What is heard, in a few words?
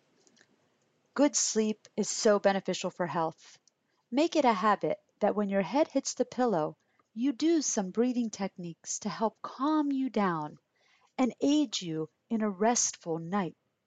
Breathing